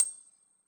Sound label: C7, Harpsichord; Harpsichord +ped; Total Piano